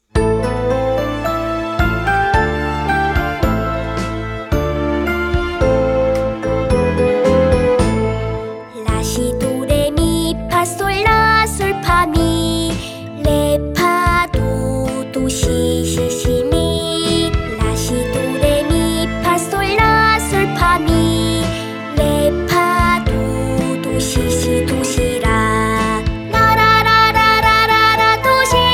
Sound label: scale